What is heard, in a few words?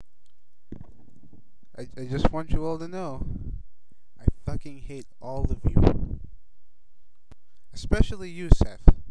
Message to Chat